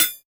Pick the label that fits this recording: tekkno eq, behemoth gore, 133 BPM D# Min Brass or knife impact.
knife impact